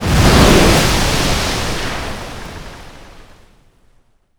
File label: wave crash; Modern Warfare